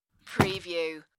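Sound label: Throw Wallet Table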